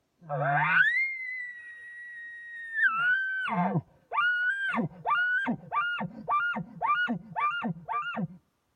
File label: Calls Location Bugle With Chuckle